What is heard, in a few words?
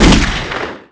ZMG (9mm)